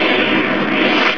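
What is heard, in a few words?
fire magic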